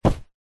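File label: foley clothing jacket hit punch sweetener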